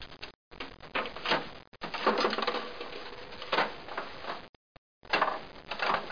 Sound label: ARCADE; 12000 Graphics Pack; Media Graphics